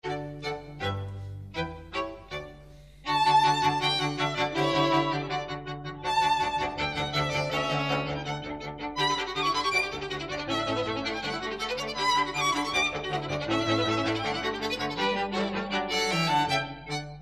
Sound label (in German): Presto D-Dur Mozart Quartett